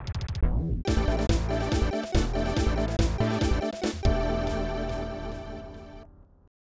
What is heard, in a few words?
Battle Fanfare; Dragon Ball Z Hyper Dimension; SNES